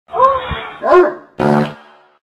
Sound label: moan bark fart